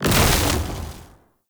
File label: Hunter Sniper Shot